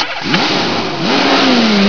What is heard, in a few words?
launch